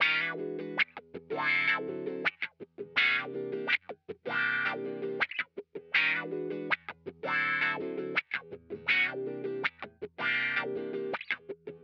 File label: Funk Guitar; Stringed Instruments